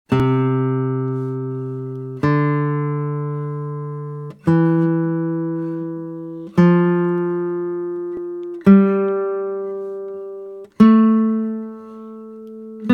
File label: The C Major Scale, ukulele